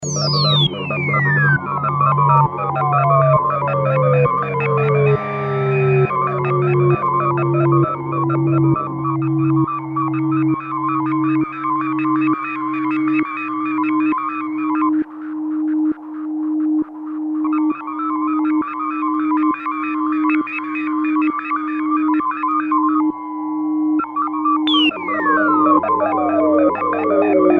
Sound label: FX reverse, Kawai sx240